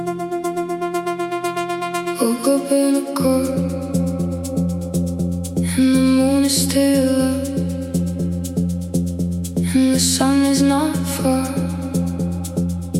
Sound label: deep house